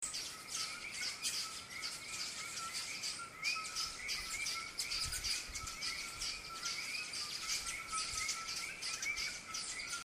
Nesospingus speculiferus El Yunque National Forest Puerto Rico; West Indian